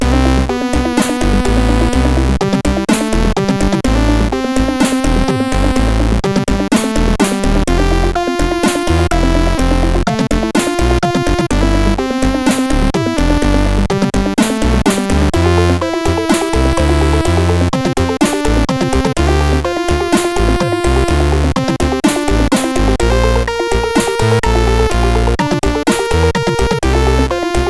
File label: Happy Birthday, CSG8580R5